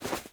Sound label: Movement Foley I; Wisp